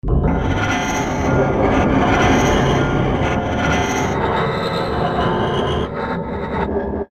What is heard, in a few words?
scratch